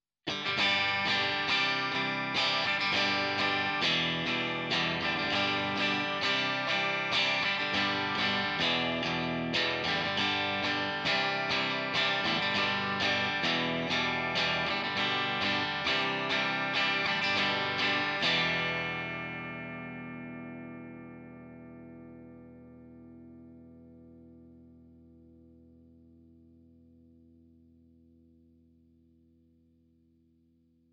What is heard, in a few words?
Fender Treble Clean; Gitarrenvergleich